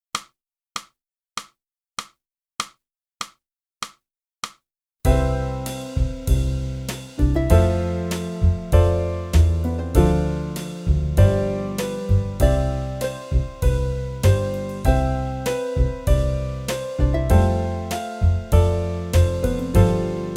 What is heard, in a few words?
flute; boosey